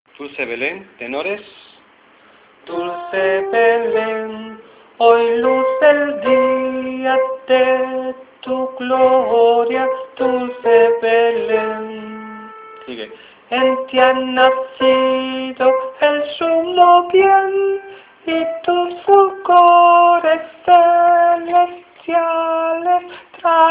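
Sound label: tenor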